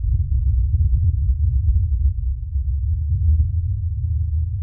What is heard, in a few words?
aura loop; garrysmod